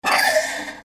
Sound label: electric door